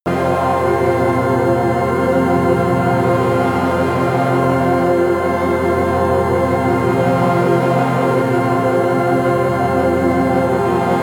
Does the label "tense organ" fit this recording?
Yes